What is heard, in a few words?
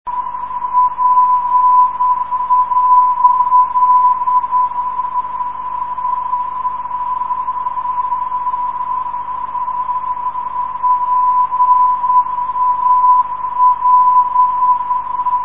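pal 355.5khz, non-directional beacon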